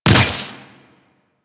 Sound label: Projectile Explosion; Warframe; Sentients; Brachiolyst; Abilities